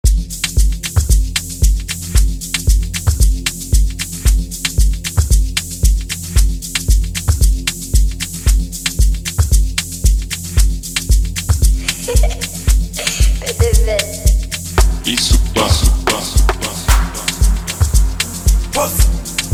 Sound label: Amapiano